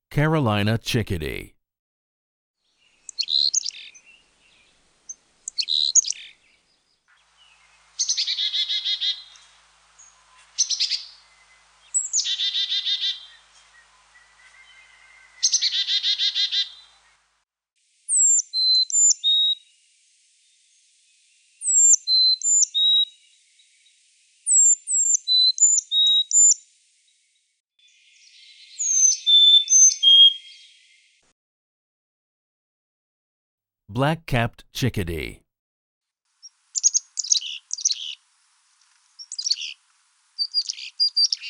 Black capped Carolina Chickadee, bird calls; Non-Game Birds

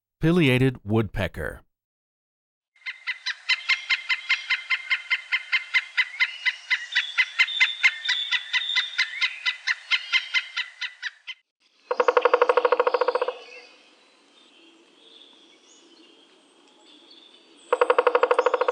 Pileated Woodpecker

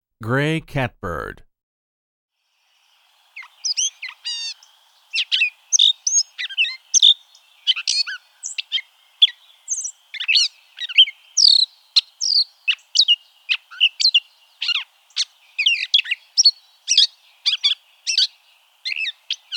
Gray Catbird; Non-Game Birds

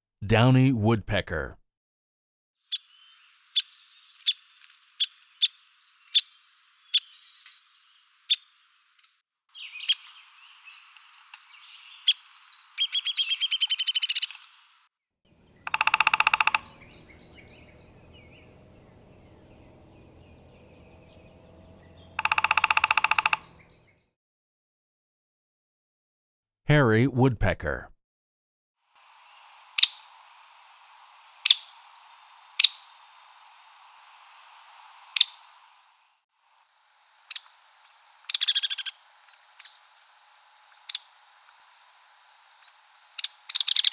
Downy Hairy Woodpecker

